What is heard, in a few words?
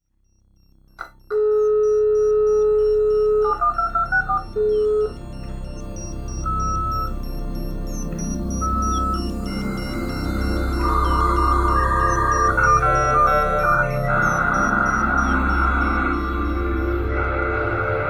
jingle; chaosradio